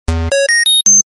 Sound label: Notifier Electronic; V710 Ringer